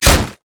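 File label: armadillo basic attack; rammus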